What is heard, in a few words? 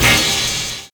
OU VOX; Univers Sons